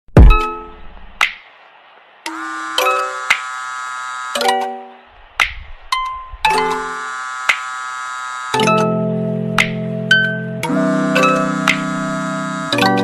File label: Clicks Claks Ringtone